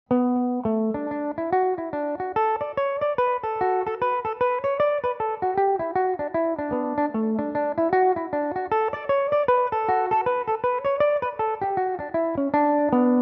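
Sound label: reel D major belmuse, irish mandolin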